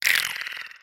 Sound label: relax, clams; infestation